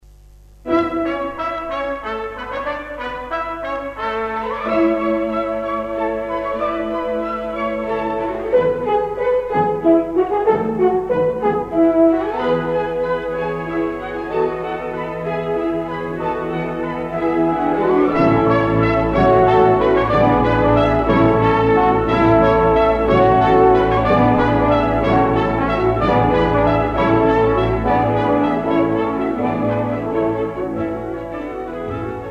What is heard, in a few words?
orchestral; Oranges and Lemons